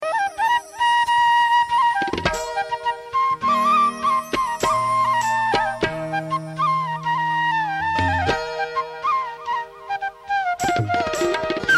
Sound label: flute